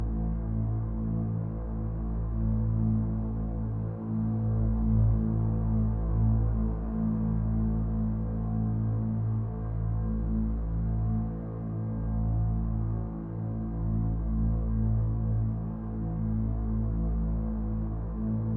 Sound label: Gnome Village Transition Music Loop; bramble the mountain king; ps4 ps5 switch windows xbox one xbox series xs